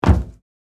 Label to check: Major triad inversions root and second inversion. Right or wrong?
Wrong